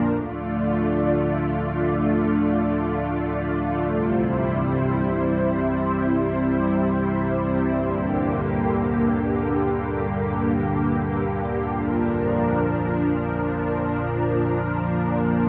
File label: wave pad